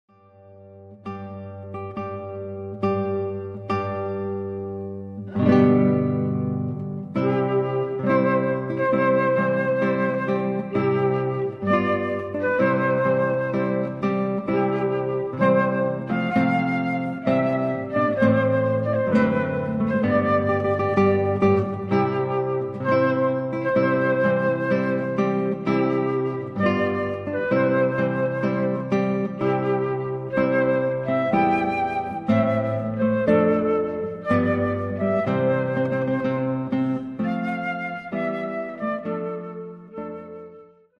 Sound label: Bridal Chorus